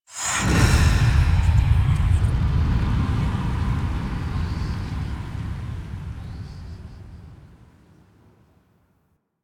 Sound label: Hand Compass Start Earthquake; Ambience; Duviri; Warframe